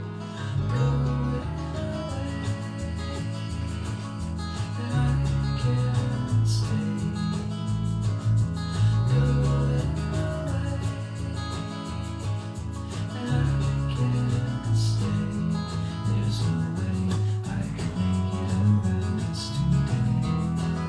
recording the last bass line to our last sleepy song